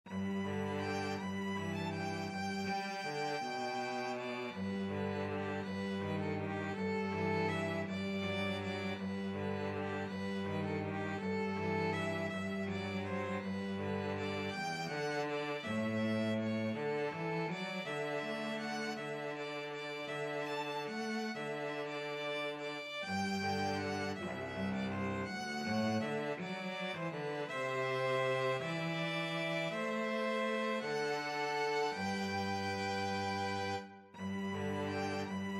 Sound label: violin cello duet